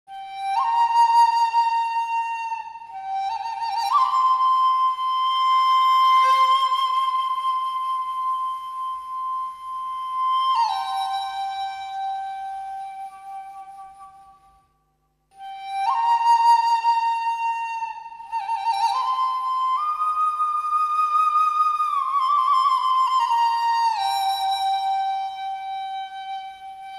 Chinese Flute

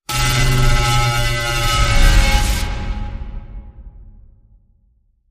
Siren Bend

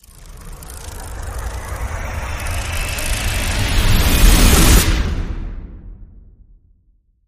Fairy Flight Rise

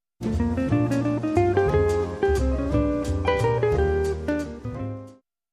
Guitar Waltz

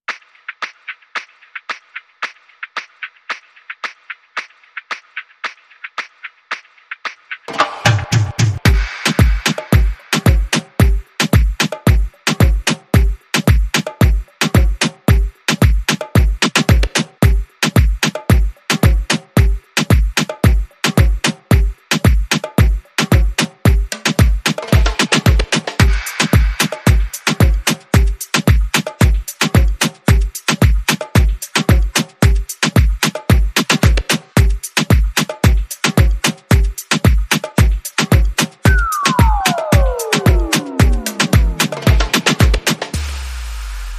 Poppish YooHoo Beat

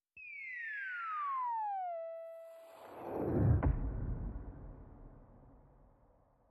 SFX

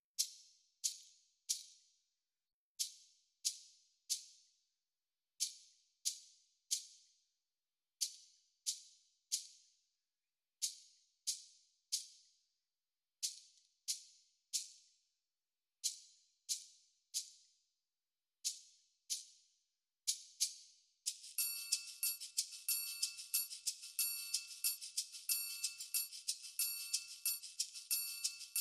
Drums and Bass Only

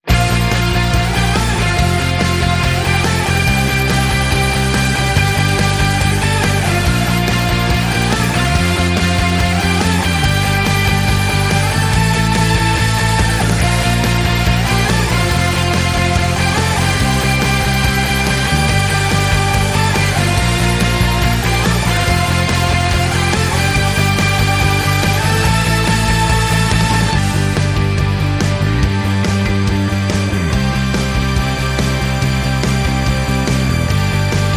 Lower Drum

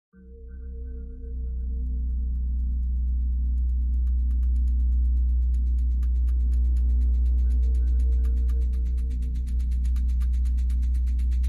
Drums and Bass Only

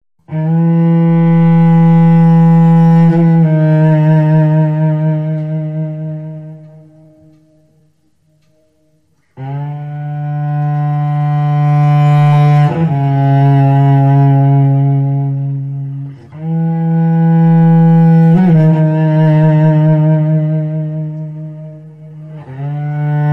Cello Whale Song

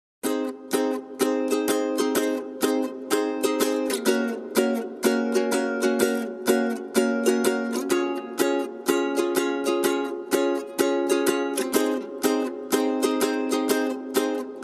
No Drums Reduced Arrangement

